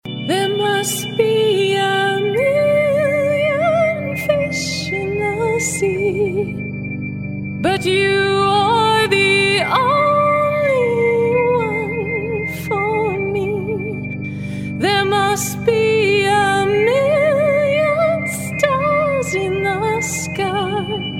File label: mixed singing samples